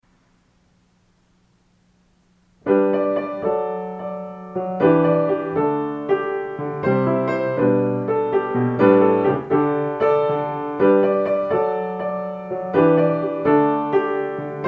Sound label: Jingle Bells Intermediate